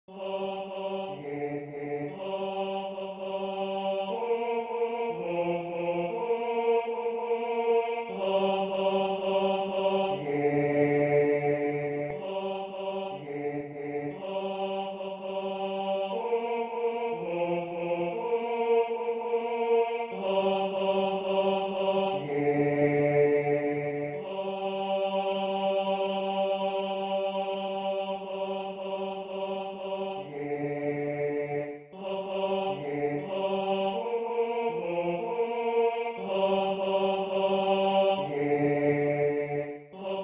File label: Bass; Sanctus; Messe De San Lorenzo